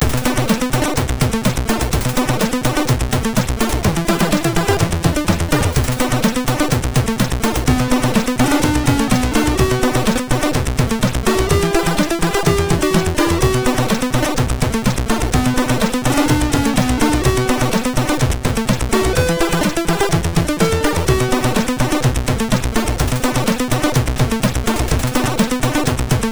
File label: Game Intro, sid MOS6581R3